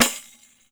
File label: PANDEIRO, Brazil Drum Perc